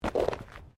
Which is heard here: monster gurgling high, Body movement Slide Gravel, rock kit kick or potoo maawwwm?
Body movement Slide Gravel